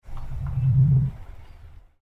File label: motorics skill theme Klang